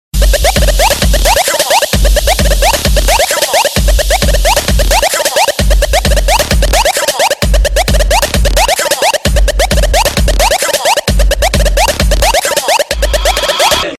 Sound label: hipnose ring music